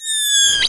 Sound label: night vision off, drones; garrysmod